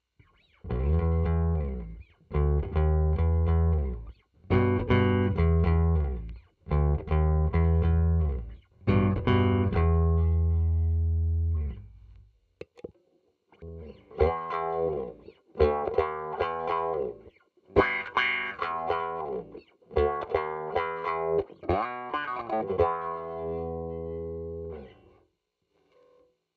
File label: autowah